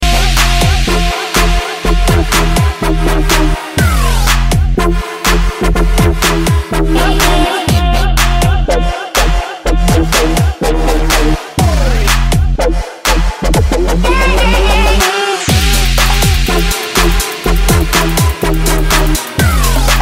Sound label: wawe dub